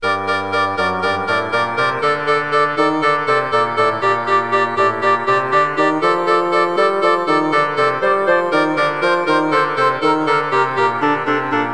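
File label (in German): spielmusik flöte klarinette fagott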